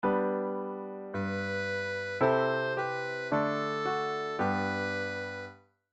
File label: figured bass chordal